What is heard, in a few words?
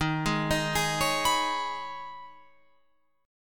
Eb 7th Suspended 4th Sharp 5th, Guitar, Open F; Eb7sus4#5; arpeggiated